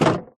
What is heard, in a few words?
close, fence gate; Minecraft; block